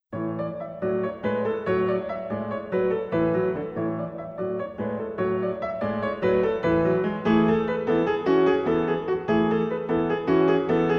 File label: Bulgarischer Tanz Bulgarian dance